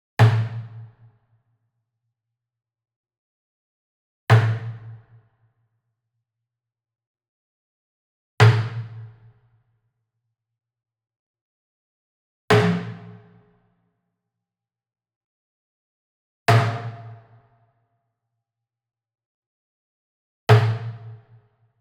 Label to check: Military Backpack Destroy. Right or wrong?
Wrong